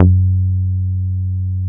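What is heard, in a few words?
direct bass F#2, analog bass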